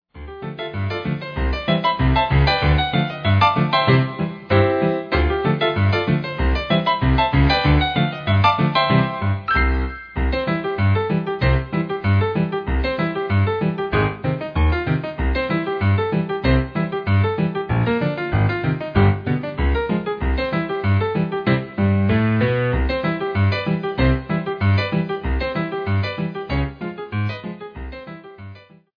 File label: Ragtime Spoof